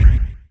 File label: VFX2 Sub